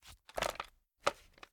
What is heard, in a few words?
plr ar reload empty xmag fast twist, weapons; viper